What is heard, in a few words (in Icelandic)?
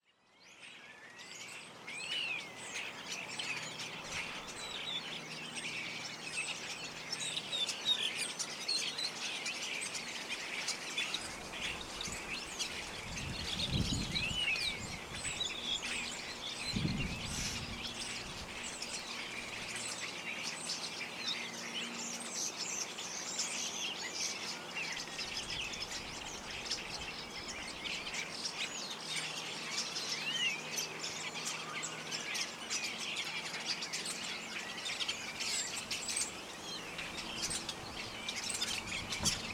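stara og skógarþrestir; starra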